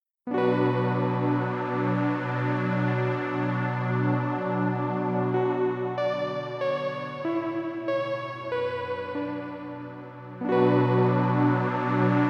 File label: Ambient Ver.